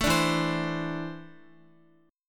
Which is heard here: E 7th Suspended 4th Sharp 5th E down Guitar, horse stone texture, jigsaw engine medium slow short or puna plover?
E 7th Suspended 4th Sharp 5th E down Guitar